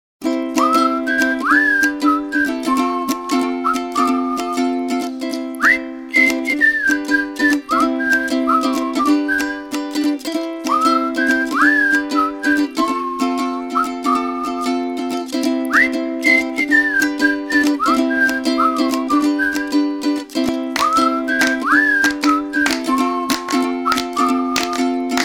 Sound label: Ukulele Song